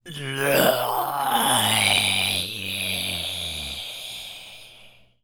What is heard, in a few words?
Male Taunt, Zombies; zps; Male1